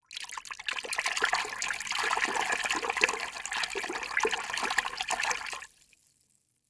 Liquid Water Movement In Plastic Bucket Hands Splashing Fast